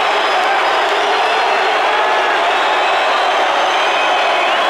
BBall Crowd Cheer Loop; SFX Kit; Crowds

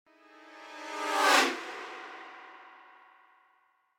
Horn Trumpet Doppler By - Ominous Passing - Chord; Hollywood Edge; sound designer's toolkit v2

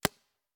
Paintball Gun; Sound Ideas; Guns

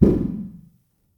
FILTERVERB, impulse responses

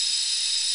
Zip Line Wire Loop; SFX Kit

